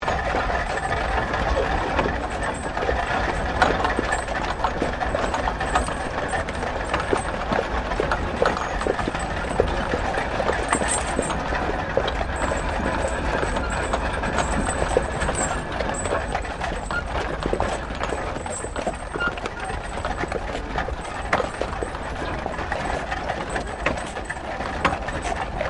Wagon Horsedrawn 4 Horse Slow Loop, Horsedrawn Wagon; Carriages; Vehicles